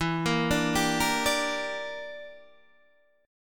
E Minor 7th Flat 5th E, Guitar DADGAD

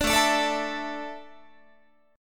A Suspended 4th, Guitar; Asus4; strummed

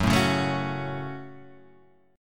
F sharp Minor

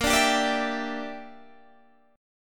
Bb 6th Flat 5th, Guitar, Open G

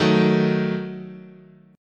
D sharp Diminished, chord

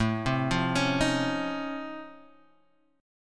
Db Augmented Major 9th, A

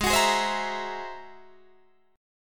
G sharp Augmented 9th G sharp Guitar Open D

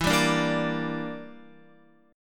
E Minor 7th Flat 5th E, Guitar; Em7b5 strummed; x,7,7,7,10,10

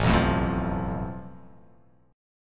A Suspended 2nd Sharp 5th

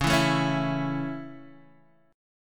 Db Minor Sharp 5th Db Guitar Open D

